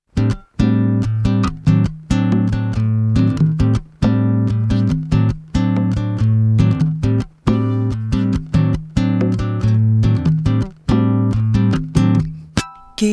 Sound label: Bossanova Version; Key Box for Two Decades